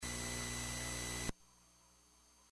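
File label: noise be gone; c64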